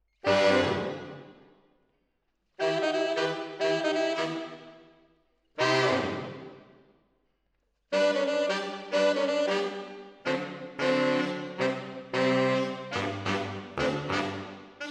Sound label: MKH AB, piano